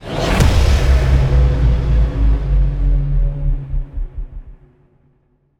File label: Stinger Modulate